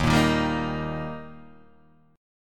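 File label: E flat Major, Guitar, Half Step Down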